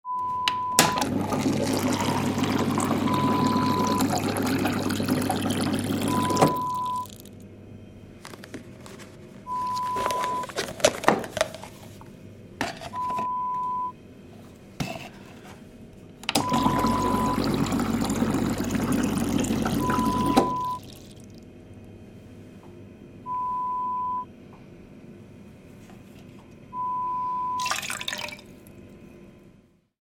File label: water cooler sound